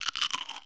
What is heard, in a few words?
Eat; FRACTIONS PIZZA